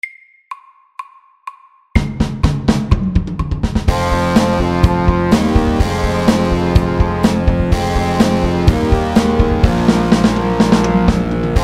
Heavy metal FAST 125

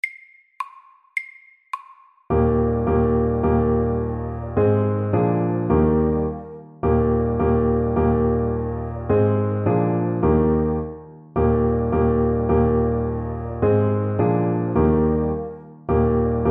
Ecossaise MEDIUM 106

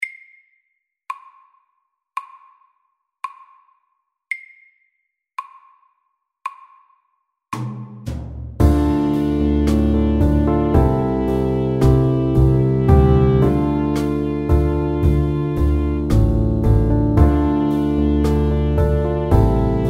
Pop ballad SLOW 56

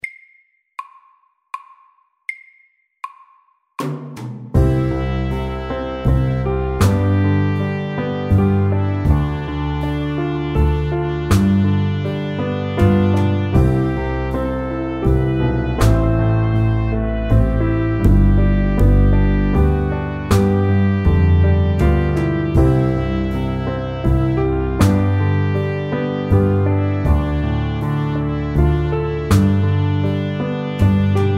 Soft rock MEDIUM 80

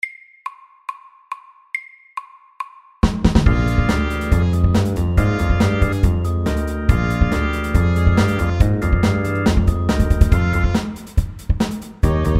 8 bar blues FAST 140

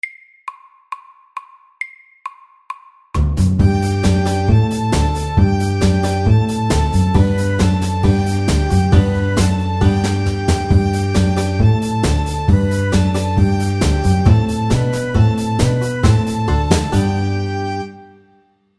Square dance FORT

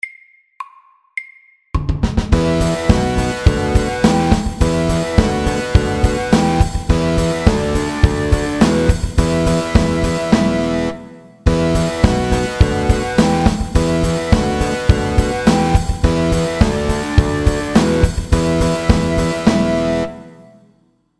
Mountain rock FORT

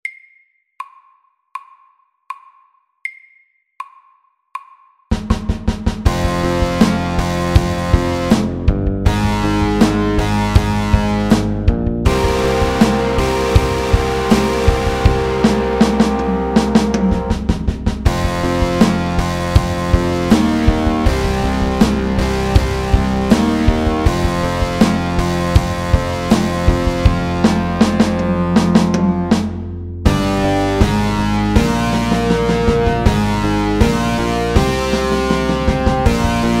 Pirate rock SLOW 80

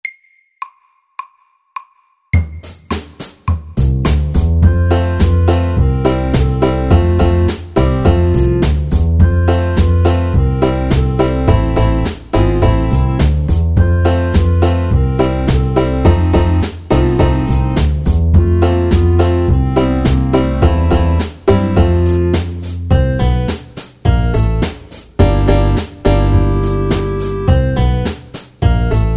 Country dance MIDDELS

